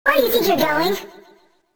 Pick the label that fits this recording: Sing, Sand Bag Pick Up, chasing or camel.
chasing